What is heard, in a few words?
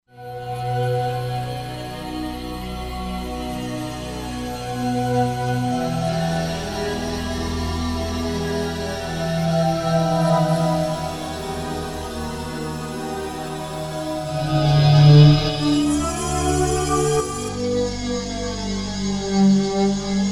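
Pad Vortex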